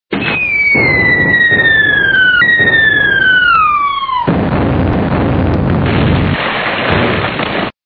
Ship Crash

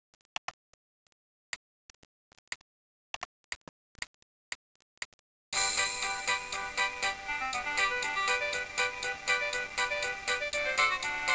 polka

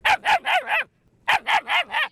Dog Barking

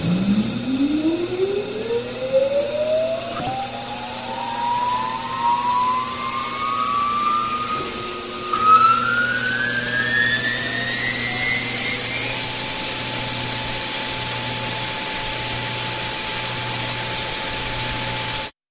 Turbine Startup

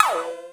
ricochet

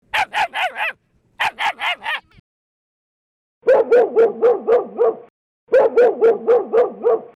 Dogs

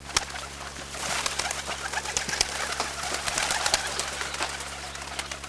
Bird Flapping

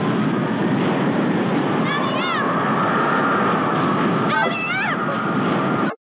Wind tornado

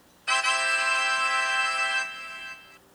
Ta Da

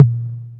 Bonk

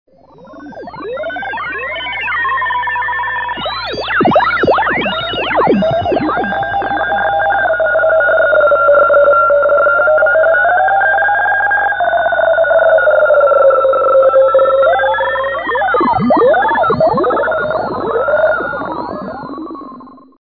Frequency up

